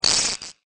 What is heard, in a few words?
TOY WIND